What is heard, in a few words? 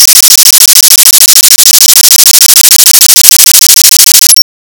fog noise